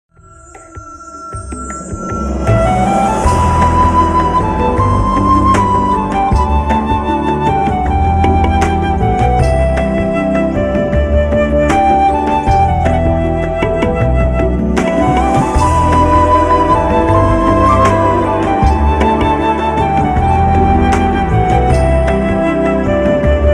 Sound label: ringtone; citycell bangladesh